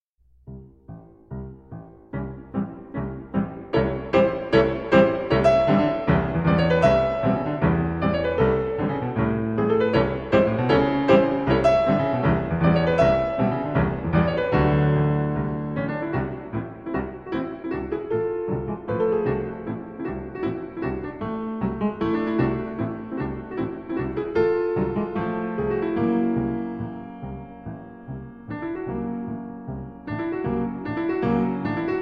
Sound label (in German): Stücke für Klavier zu vier Händen, Marsch, Allegro; Fünf Stücke für Klavier zu vier Händen; Induló; (1942)